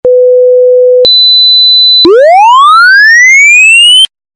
melody SWEEP; LG K83 5G

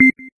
self timer, camera; Sony Ericsson W8

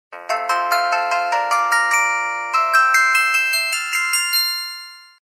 Harp Tone; Samsung SGH-i560

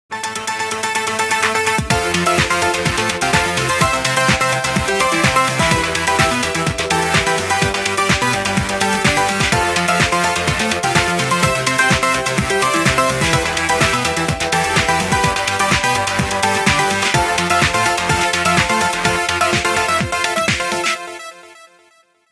Ice-breaker, Ringtones

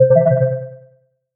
Cobalt, notifications; Surface Duo

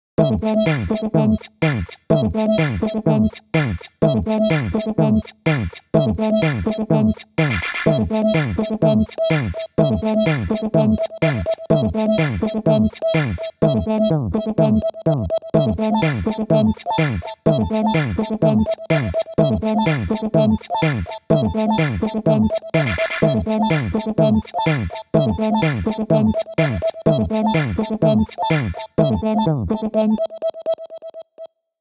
Miku techno; Sony Xperia A SO 04E

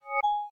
Wireless Charging Finished; LG K83 5G

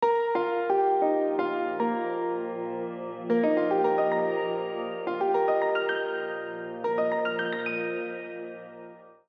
system ringtones; Amazon Fire-Phone

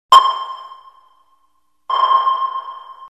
Tone; NGM Raptor; NewGenerationMobile

